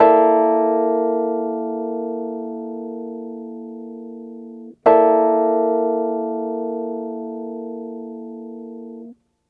Smooth Groove Rhodes, 70s Analog Funk

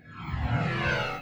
DANCE FX

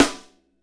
snare, Hip-Hop Rap; Northstar; Drumscapes Roland

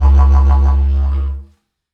Didgeridoo Kit Samples

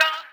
Fx Vox; Equipped Music; Loungin House

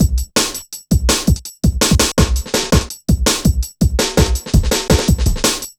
Programmed Stepz, Jungle Drum Loops

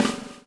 BUBL, BUBBLE; Spectrasonics - Backbeat

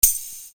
Percussion, EuroDance

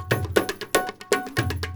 India, Heart of Asia

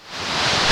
NOISE; AKAI S-Series CD-ROM Sound Library VOL-1

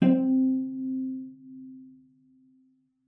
cello pizz C4; 90 s Sample CDs; Xsample VOL-5

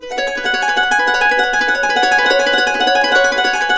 Harps; USB Soundscan vol.62; Complete Harps; AKAI; BISBI5 70

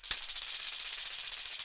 TAM; Sampleheads; NYC Percussionworks; 90 s Sample CDs

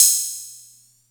Music Percussion Computer HH open, Drum Machines

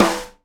SNARE RIM, Live Snares

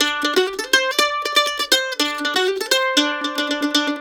LICK, Blues Guitar

